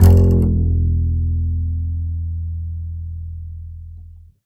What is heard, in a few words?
Upright Acoustic Bass; 1ST POSITION; Larry Seyer